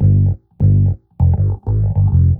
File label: bass, Loops